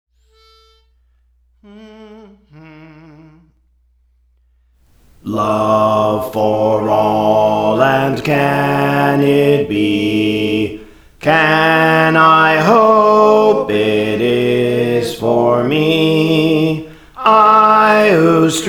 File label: Bass, Songs; Praise For The Lord Training